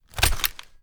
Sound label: raise, reloads; gmod; iw8